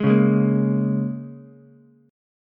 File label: A sharp Minor 7th A sharp Ukulele, chord; strummed